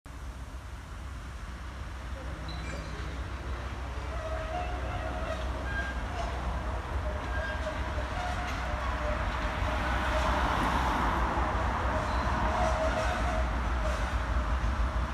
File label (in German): Ausfahrt Bf. am Haseschacht